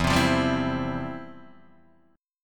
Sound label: F Major 7th Suspended 2nd, E, guitar